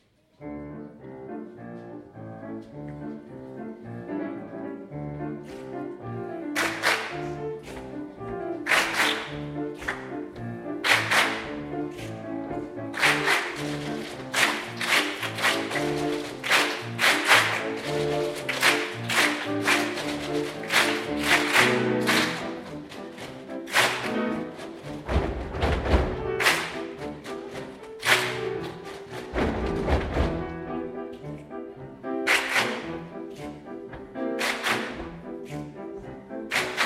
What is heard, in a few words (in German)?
Body Percussion, Jugendkammerchor; Ahrensburg